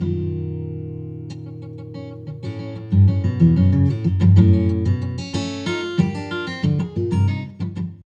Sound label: Ample Guitar Sample